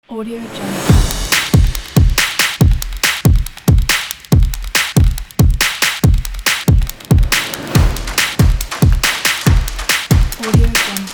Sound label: drums action stomp claps